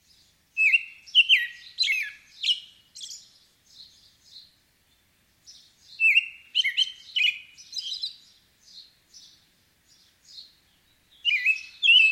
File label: american robin morning songs 6am